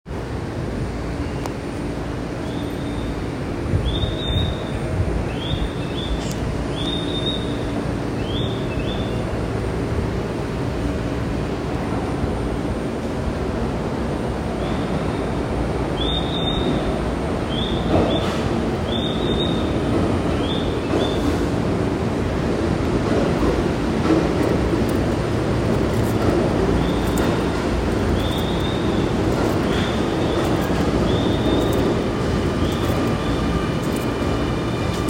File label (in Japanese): 京王線つつじが丘東接近, train sounds